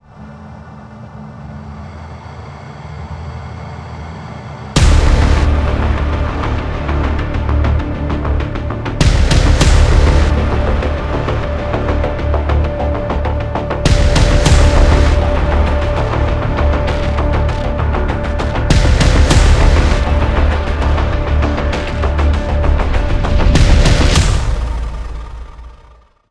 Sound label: Theme Heavy; Monster Jam Steel Titans